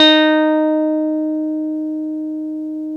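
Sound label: OB8 piano EB3, OBERHEIM OB8; Big Fish Audio - First Call Vintage Keyboards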